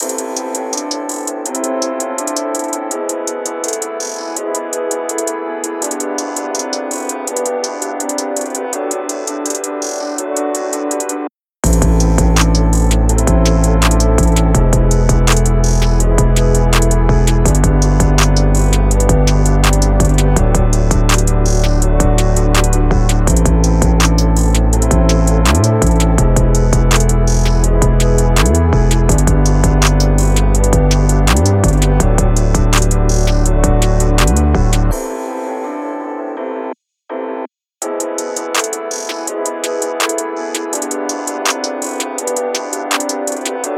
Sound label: Am BPM165